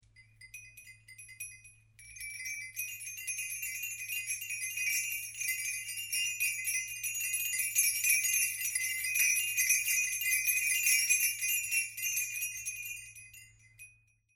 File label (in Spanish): esquilines, cencerrada; felipe vuelve a casa con las ovejas